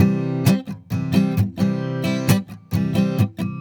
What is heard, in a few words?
Strummed Acoustic